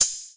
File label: open hi hat, drums